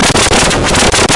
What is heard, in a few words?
weird